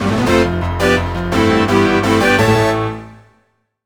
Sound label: Success Win